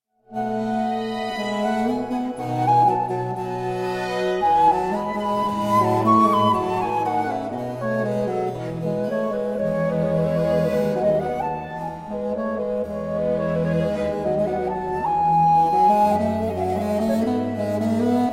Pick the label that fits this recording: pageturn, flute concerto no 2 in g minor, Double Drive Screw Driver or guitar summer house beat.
flute concerto no 2 in g minor